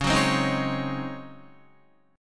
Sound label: Db Major 7th Suspended 2nd Suspended 4th Guitar; 9,9,10,8,8,8; 4E,4A,5D,5G,5C,6F; strummed; chord shape